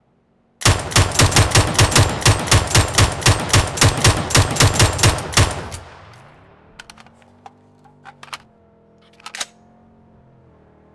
Mk47 Mutant single fire

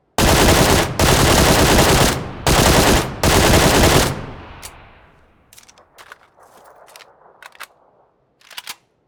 QBZ95 full auto

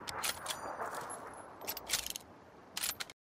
Reloading without stocks

